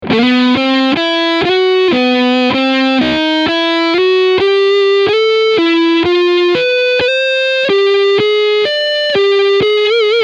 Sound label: Overdrive Front PU